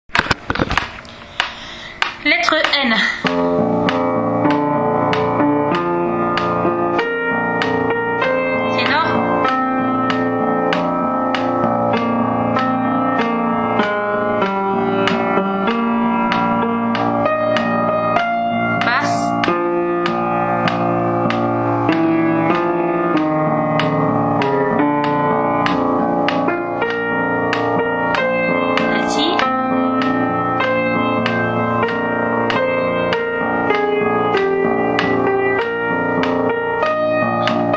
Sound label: soprano; Brahms requiem allemand; lettre N